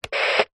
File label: technology radio small plastic turn on off short burst static